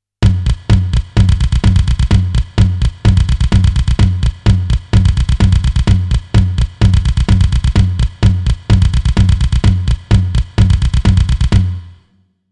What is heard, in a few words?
dynacord percuter test fx